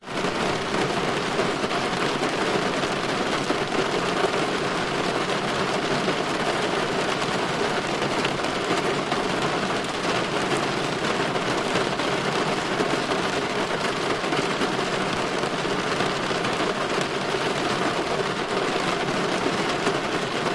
nature rain fall drips medium parked car engine off internal perspective